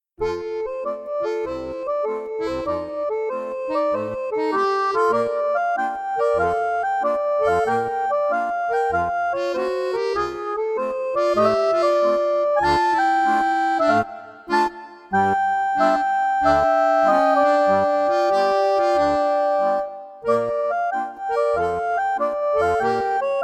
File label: Sunday Blues Duet